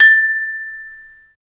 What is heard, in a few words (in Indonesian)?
suara berlari dengan; movement